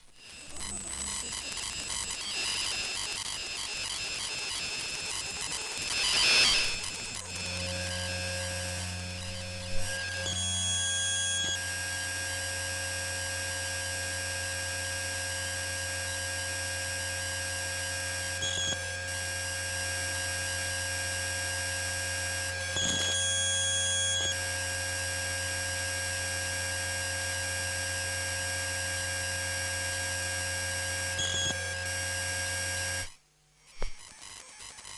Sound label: emf macbook white unibody data transmission subtle with stereo flying glitches; high tones